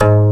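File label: gayageum A2, Korean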